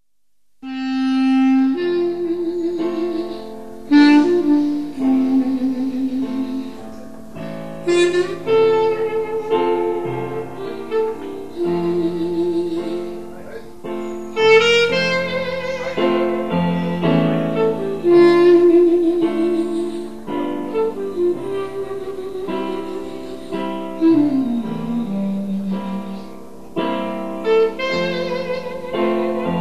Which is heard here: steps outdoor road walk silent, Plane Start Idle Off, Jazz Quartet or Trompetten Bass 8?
Jazz Quartet